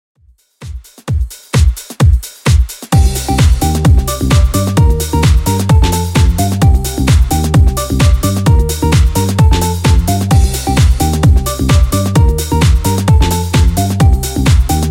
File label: Top40 DJ Friendly Redrum 130 bpm